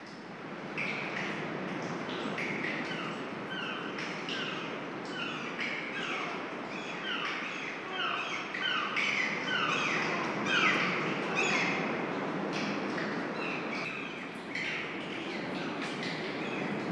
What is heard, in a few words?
sounds from a cave